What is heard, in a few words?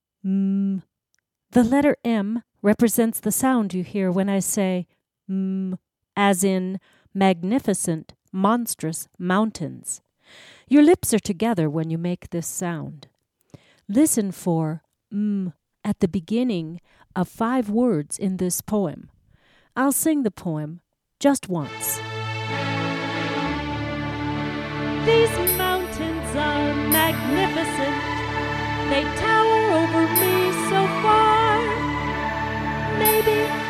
Triangle